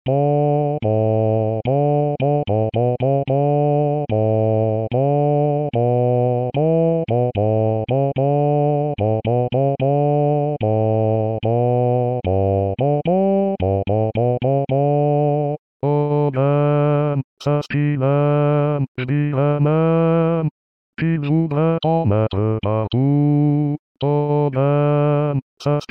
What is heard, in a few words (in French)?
basse